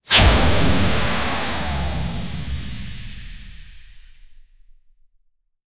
pure nitro activation sou, race

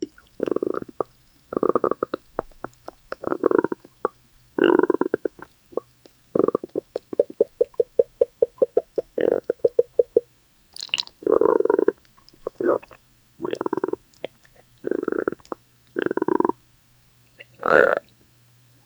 male chimpanzee suffering; sound effect

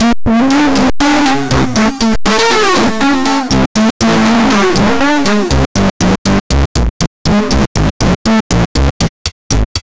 classic 70s funk instrumental

